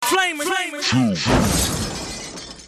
GLASS, Hip-Hop Vocals Samples; FLAMERZ

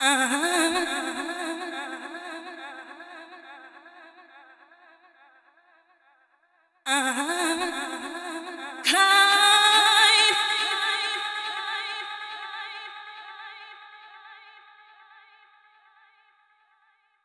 Vocal 140BPM; D minor; Unlooped; Vengeance Samples

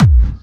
Bassdrums Clubby Kicks; Vengeance Samples

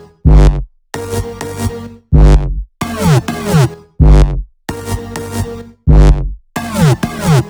Melody Kits 128BPM Root G; Vengeance Samples

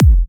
Bassdrum; Vengeance Samples; VEE Bassdrums

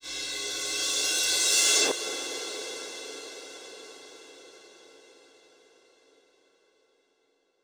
Cymbals Reverse Crash; Vengeance Samples

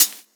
Cymbals HH Open; Vengeance Samples

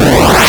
Arcade Riffs

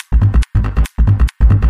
Loop 140 BPM; vengeance essential club sounds-3

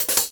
hat, DnB Breakz Kit; Breaks N Beats Sound Kit

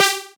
Synth Cuts; Vengeance Samples; VES1 Instruments

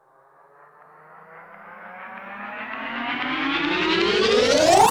Sweeps Medium

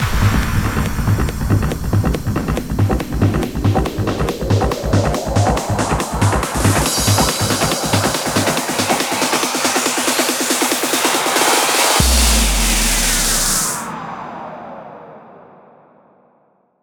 140BPM 8Bars, HandsUp & Trance Fills; RedDust; Vengeance Samples

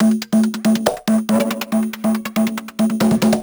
Loop 140BPM; Vengeance Samples; VTS1 Bonus Sounds

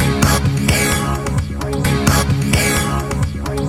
Special Sounds Root C# 130 BPM; Vengeance Samples; VEH3 Special Sounds

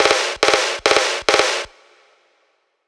140BPM SNARE; 1Bar; Vengeance Samples; Snares&FX Pairs

